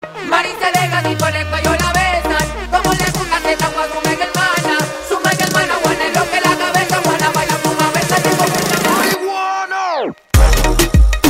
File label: Moombahton